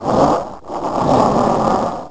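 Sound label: scream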